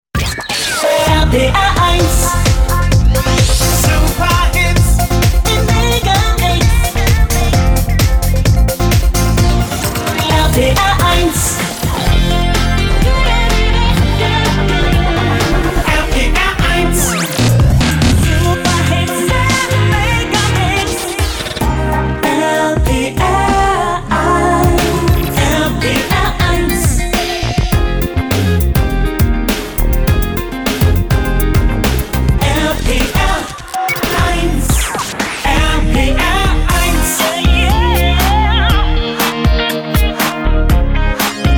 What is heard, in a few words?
Jingles; RPR1